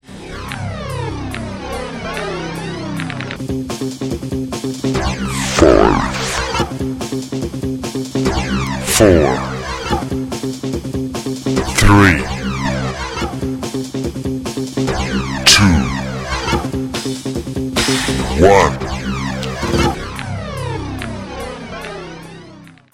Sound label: jingle; novinarska fela